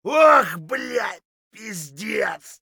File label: hurt near death, voice; Escape from Tarkov